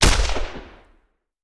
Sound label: Machine Gun; Sound Ideas; Guns